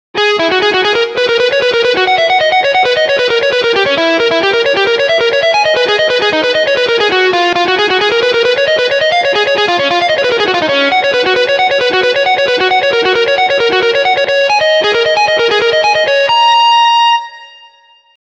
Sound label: crystal energy guitar solo